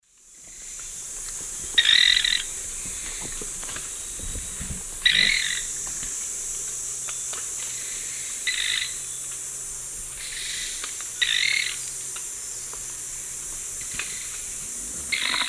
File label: Hemitriccus zosterops, calls or song; S. America; Eastern Lowlands